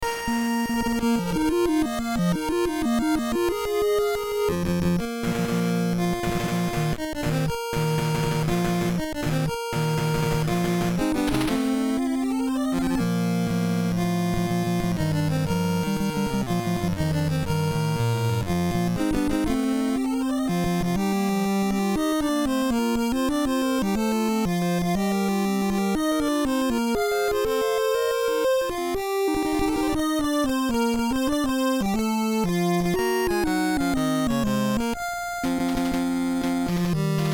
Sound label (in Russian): 8 bit; star wars